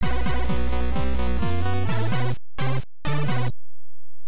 Unknown Jingle; overlord nes

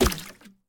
Enemy Hit; Jasmine Corote

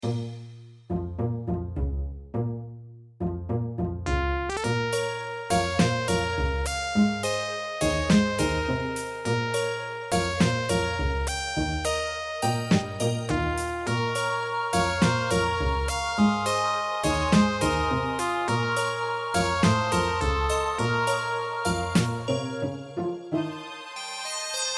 Suspense; A Summer with the Shiba Inu; PS4

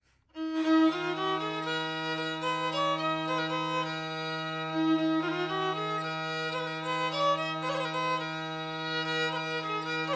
Tavern; Assassin's Creed Unity

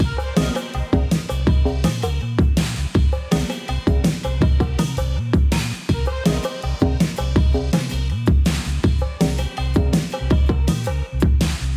DnB; hot lava; ios macos windows

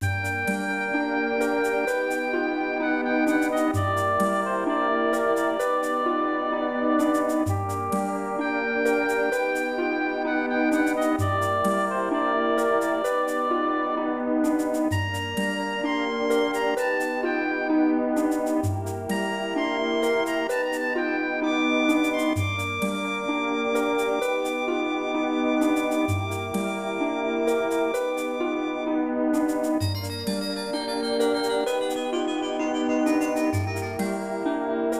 Cave; Elfaria; SNES

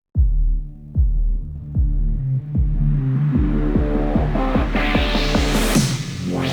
Forest Begin Fighting; bright memory mobile

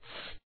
Tray slide; papas cupcakeria online windows gamerip 2013

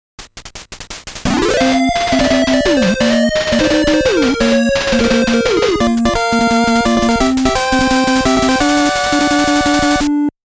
All Stage Clear

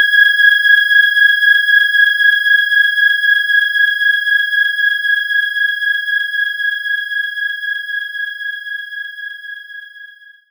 Voice Loop C5

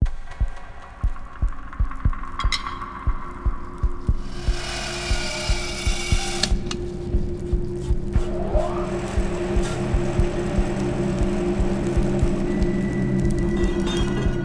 portal; House of 1000 Doors Serpent Flame

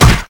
Damage; B Nectar; Monster Squad

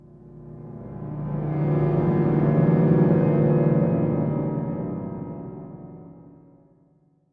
amb strings; gamerip; blackfaun

